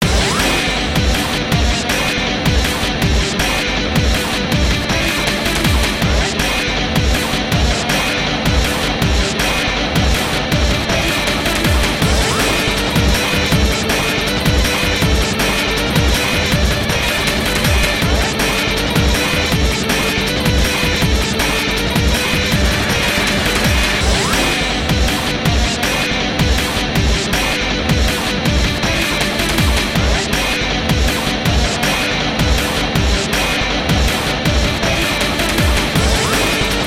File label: bgm sea battle middle boss